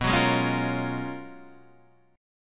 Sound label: Ab Minor 13th, B, guitar chord